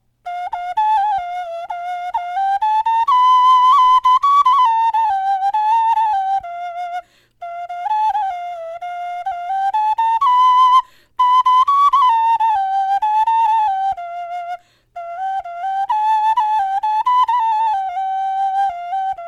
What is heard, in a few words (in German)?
melodie, knochenfloeten